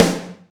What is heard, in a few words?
snare one shot f key, Royality free steel snare drum tuned to the F note. Loudest frequency: 1478Hz; snare drum samples